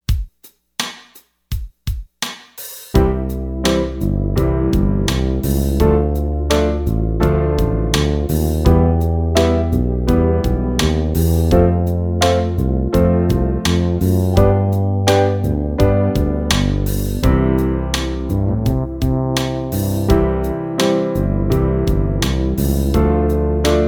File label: 1st Inversion Study Slow